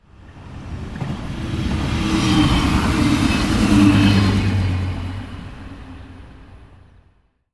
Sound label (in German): Zug Vorbeifahrt